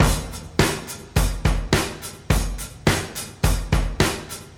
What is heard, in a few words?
105 bpm drum loop sample f sharp key